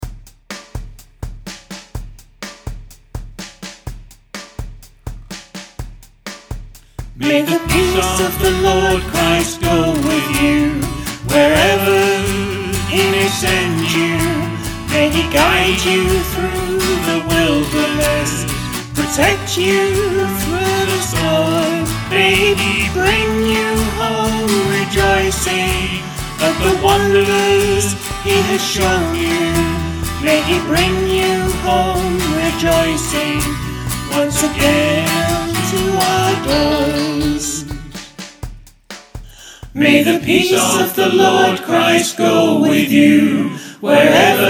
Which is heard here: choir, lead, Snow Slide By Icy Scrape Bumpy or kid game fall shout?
choir